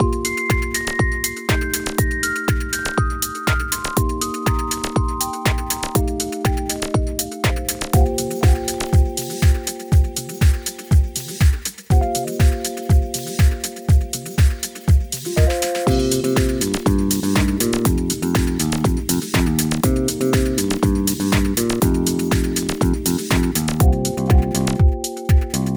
Sign In Screen; LeapPad 3

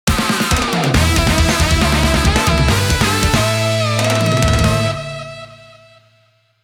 Power Activation; Advance Wars 1+2 Re-Boot Camp

